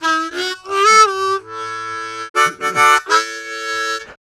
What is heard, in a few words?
Harm 098bpm A, Harmonica